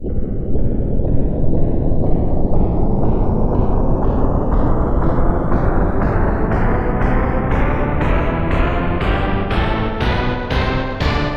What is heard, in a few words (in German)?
orchestra hit tonleiter